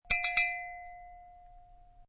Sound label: Cowbell, a cowbell being hit several times.; High quality recording of a cowbell being hit several times; Bell Sound Effects; Musical